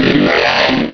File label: cry; source of the sovereign